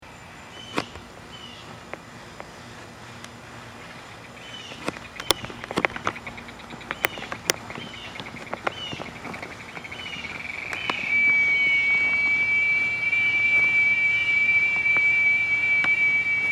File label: cicada